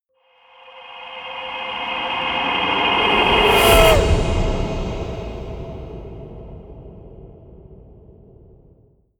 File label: haunted shout, risers; whooshes rises